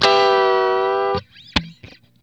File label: GTR CHORD; E-MU Formula 4000 Series Vol. 1; Hip Hop Nation